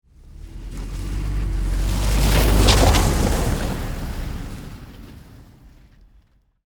Saturn Vue, Automobile, Pass, By, Slow, Snow, Grass; Transportation; BlastWave